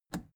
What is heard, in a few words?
plastic button scratch smooth release enable